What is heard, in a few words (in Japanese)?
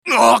ウォーロック男･被ダメージ